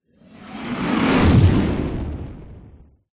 sound design cinematic whoosh dark fast horror impact; zapsplat